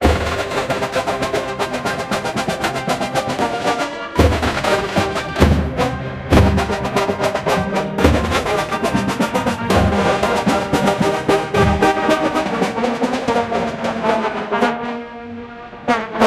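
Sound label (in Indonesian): suara parade drum band militer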